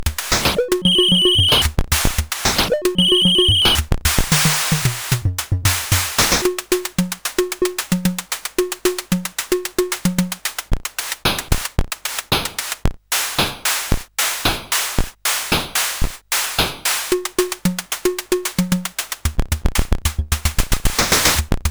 sid CSG8580R5

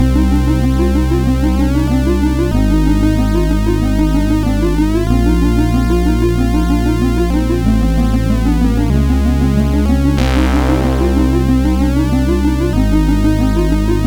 Woodbox, MOS6581R4; Griff; hvsc

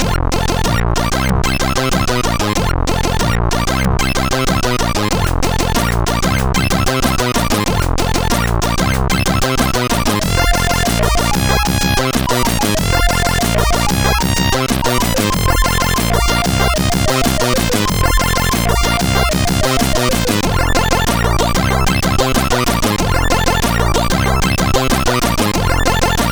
Spook, sid CSG8580R5

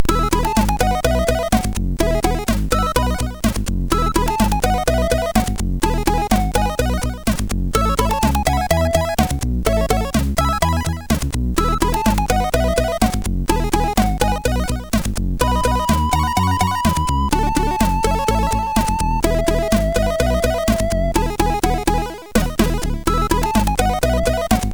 Rock, MOS6581R4

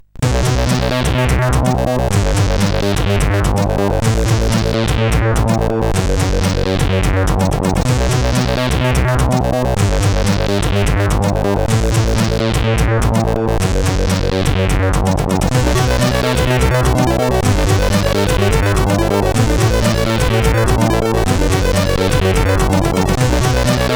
Disco Music, sid CSG8580

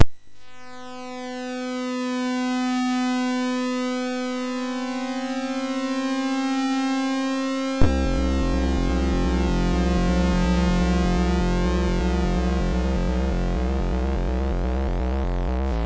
Dream Injection end, MOS6581R3

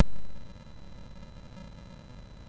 sid MOS6581R2, GAMES; Face Off

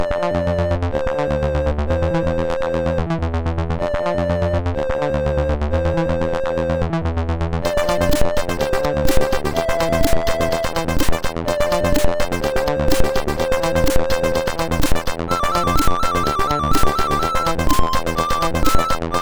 Hires Demo Kit, MOS6581R3

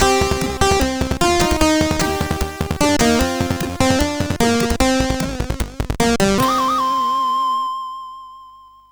sid, CSG8580R5; Gunfright

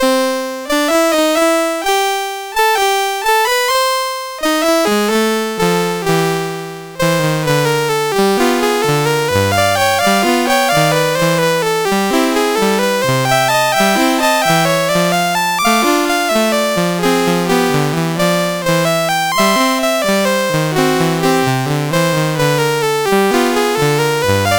Ragtime sid CSG8580R5

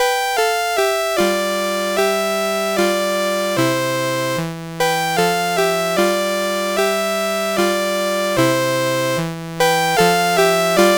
Menuet, CSG8580R5; David W Tattam

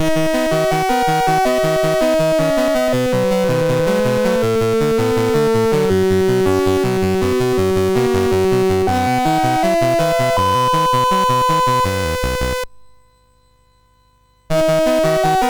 Moon Hop, sid, MOS6581R3

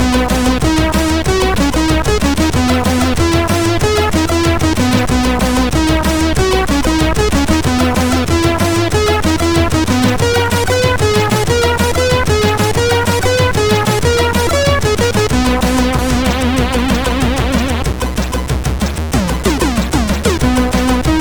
Rock, CSG8580R5

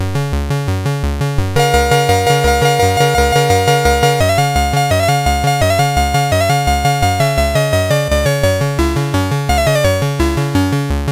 Sabre Dance, CSG8580R5

